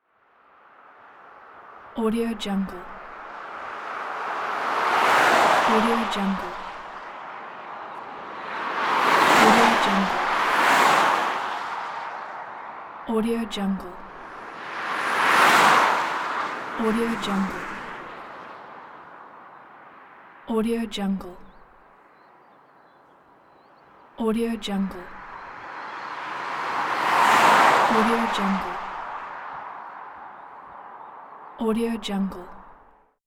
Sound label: fast cars on the highway